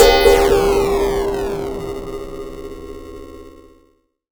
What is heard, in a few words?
Bit Crush & Moog Delay, Wire & Tin, contact mic; musicradar; contact mic madness